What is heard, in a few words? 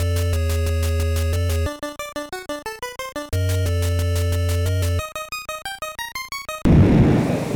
area intro; session-start; bomberman